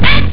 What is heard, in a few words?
ough; Amiga Soundtracker Sample Packs